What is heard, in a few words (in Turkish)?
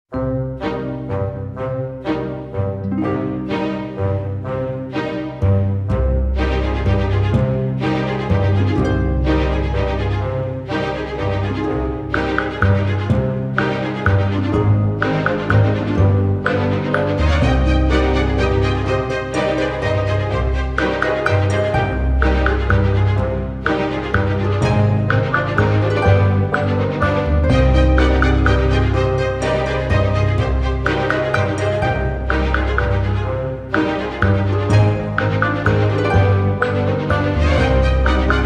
flüt; Dizi Film Muzikleri; Yali Capkini